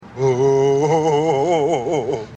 oh oh oh

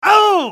spy pain severe, hurt; hl2dm

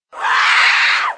scream; css; poison

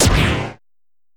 jump; pets; gasterblaster

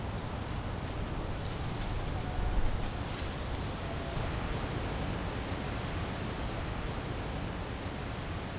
light wind leaves, ambient

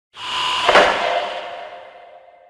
intercom stop; csgo; eternity; scp

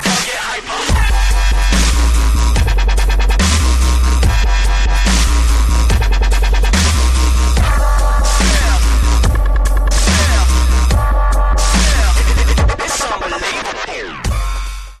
kick snare

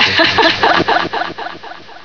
laugh; eventsounds

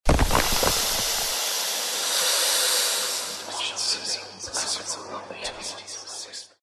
death; csgo; scp 173

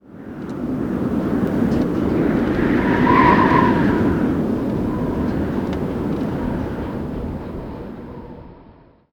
wind gust, ambient

